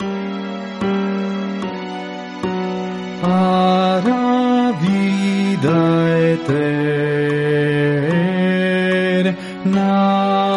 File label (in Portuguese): baixo